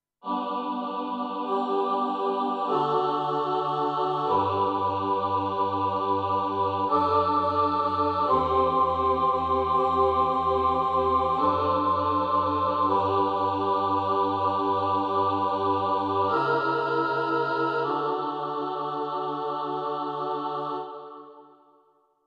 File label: Choir Aahs